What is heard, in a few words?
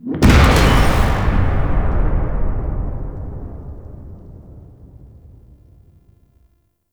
Distant Explosion; Quests; The New War; Zariman Void Kid Hand Shake